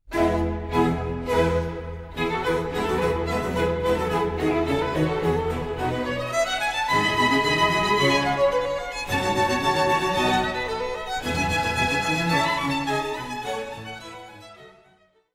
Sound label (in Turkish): SANTRAL MÜZİK